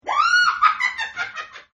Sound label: Witch Cackle, Female Witch Cackle; Evil Laughs; Horror